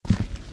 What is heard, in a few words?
plexi step; Golden Crayon Sounds